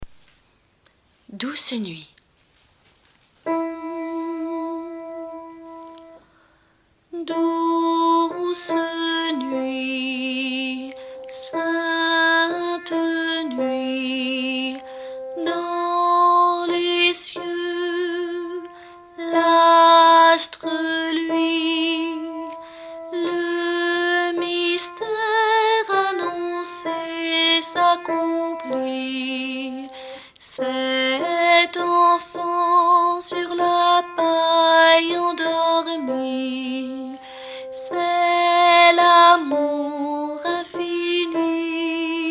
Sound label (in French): douce nuit, alto